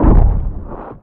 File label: reel Bounce; oceans treasure